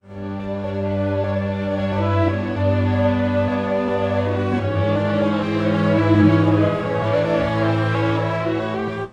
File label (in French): pitchshift strings; celemony melodyne